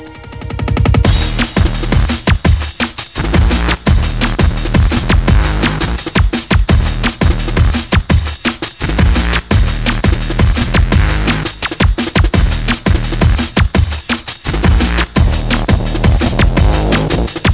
syrinx; de ritual2

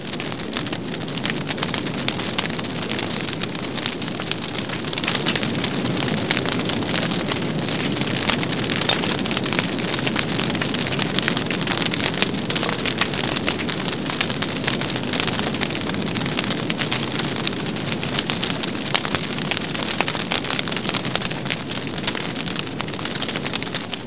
fire burn

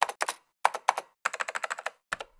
typing

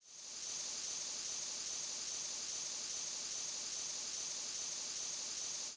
Gas; fun cube

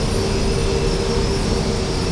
x wing warm up loop; star wars trench

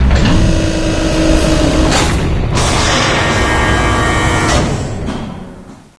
door open; dm powerstruggle

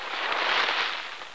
rustle

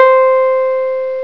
synthe

